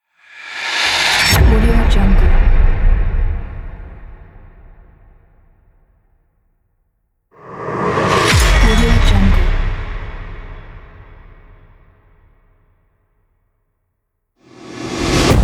epic cinematic rise

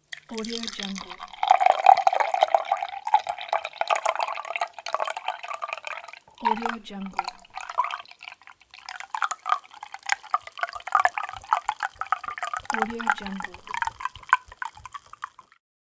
pouring juice

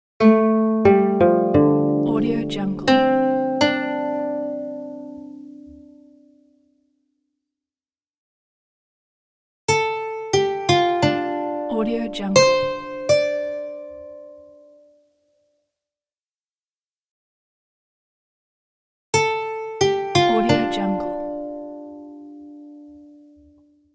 zen transition

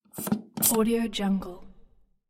objects household soap dispenser

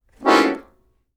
accordion; prosoundfx

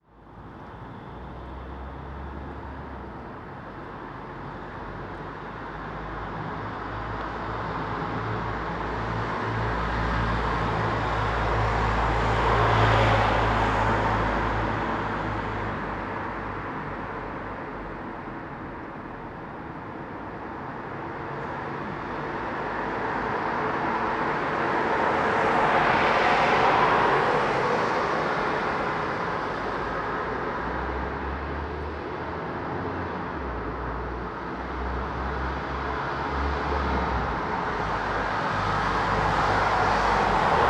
slow city traffic ambience

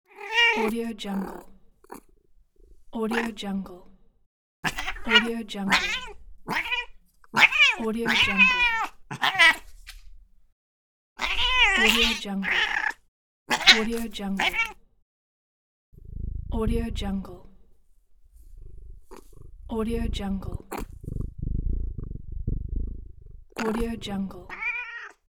cat

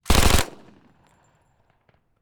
gun shot thompson m1 fast quadruple fire

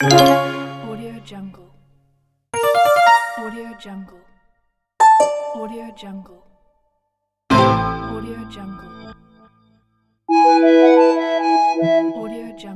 android menu ui fx

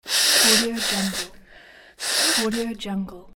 nose blow; greenwaysound

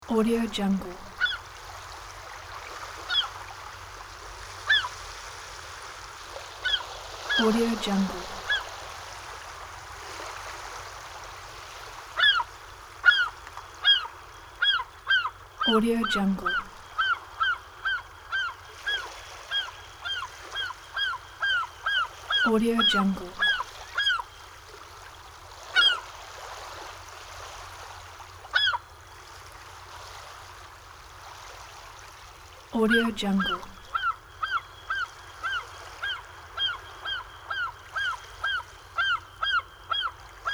seagulls at sea

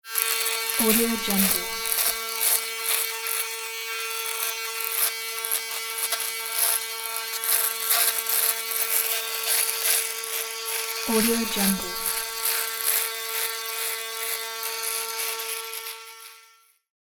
trim beard mustache

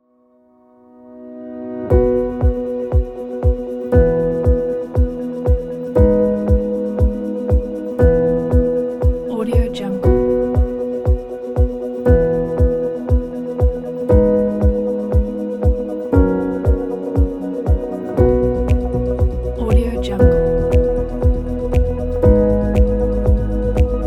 ambiance music

